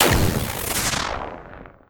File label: Gargoyle Night Burst